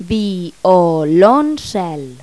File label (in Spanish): VIOLONCH; Pipo-Aprende musica con Pipo